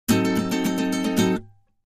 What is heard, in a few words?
Acoustic Guitar High 7th; guitars